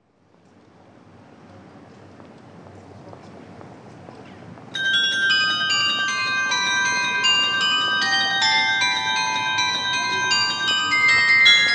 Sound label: carillon; BachFug